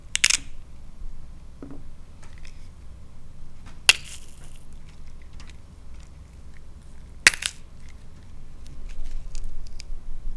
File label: Celery Cracking